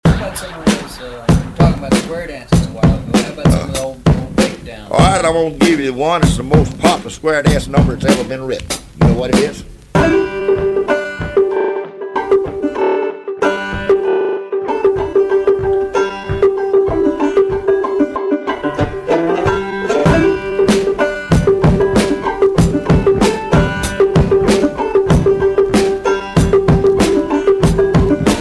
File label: Square Dance